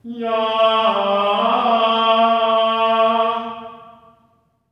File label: GR ALLELU, Symphony of Voices; Spectrasonics